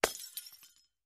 Light Bulb Breaks; Distant Glass Bulb Smash And Shatter. - Glass Smash